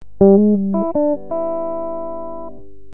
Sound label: hammer, 12bar